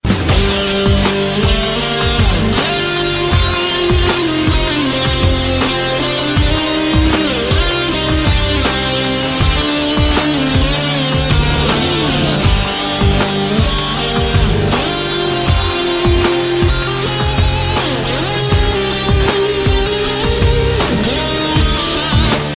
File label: guitar alive ringtone